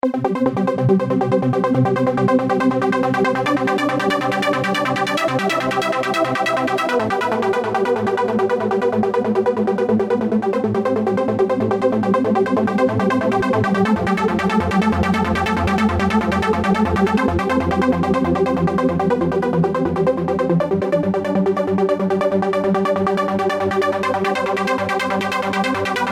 trance harmony

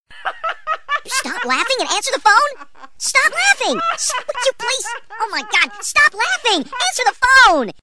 Stop Laughing

